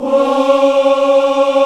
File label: VOX African Ahs, African Aahs; Roland LCDP11 Africa VOL-1